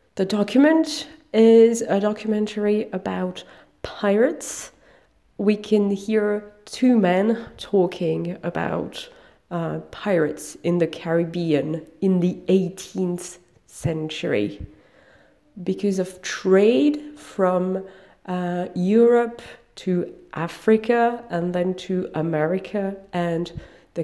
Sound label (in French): Voix